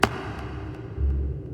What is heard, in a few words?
pick up item; We Were Here Forever